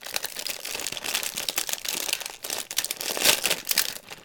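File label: open large bag Senbei; eating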